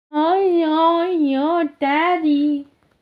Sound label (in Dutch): vreemde kreun